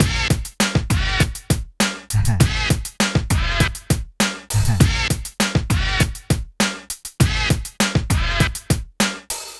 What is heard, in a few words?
PHATT BEA, Hip Hop